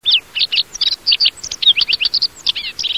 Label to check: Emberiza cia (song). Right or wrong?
Right